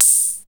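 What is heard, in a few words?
OHH, open hi-hat; X-Static Goldmine 1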